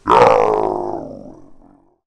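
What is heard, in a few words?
zombie attack; shamblie; npc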